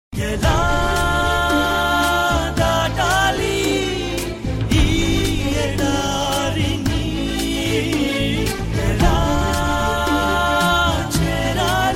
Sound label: huawei p8 official ringtone